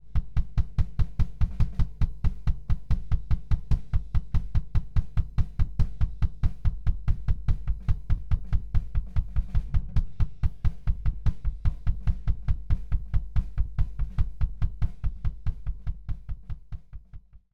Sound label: drums kick; ew seminar report; sr30